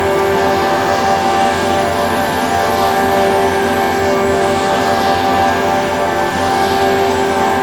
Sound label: speedshrine loop; odin